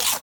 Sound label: Bite crunchy; Ed Edd n Eddy; Adromulus Pack; EEnE